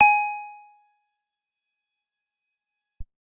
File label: guitar acoustic, acoustic guitar nylon